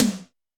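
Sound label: Snr Wet Eltrc, snare; Alesis D4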